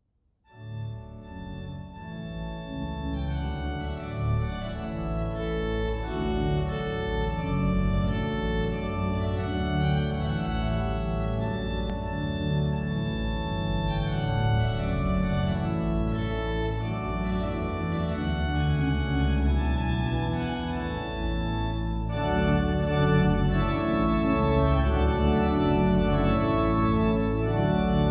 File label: trumpet tune; purcell